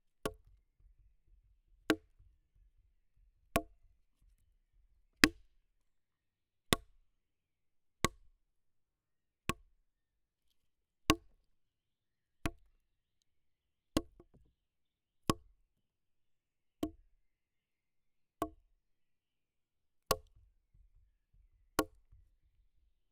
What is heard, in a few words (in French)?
Baton fin sur buches, Percu Bois